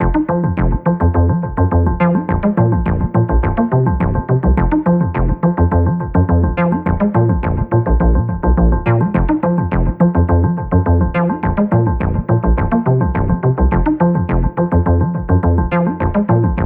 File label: 80s nostrings nodrum; gerilim; By Burak Sound Bank; tension vol 53